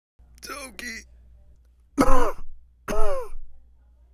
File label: coughs